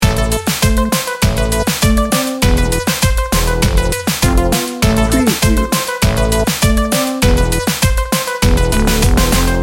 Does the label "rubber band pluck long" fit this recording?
No